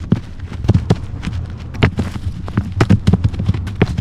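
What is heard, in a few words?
Rock Dirt Roll Loop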